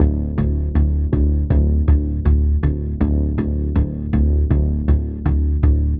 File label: Bass Loop 80 Coils D; Ghosthack